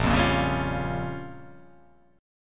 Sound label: A sharp Minor 6th A sharp down, chord